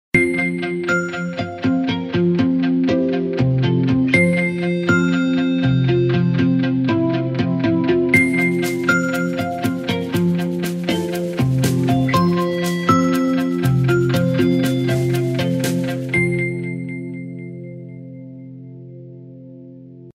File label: Pendulum, alarms; Alcatel; OT-991D